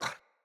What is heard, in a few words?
Kubrow Puppy Idle Snort